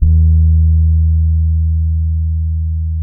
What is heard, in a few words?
SAMBA, Bass